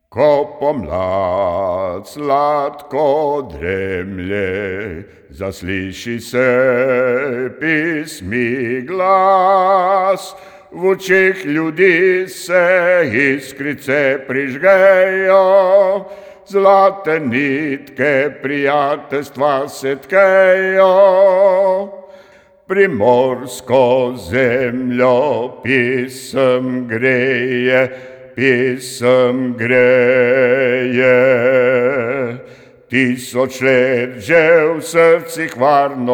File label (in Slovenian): BAS; primorska poje; skupna pesem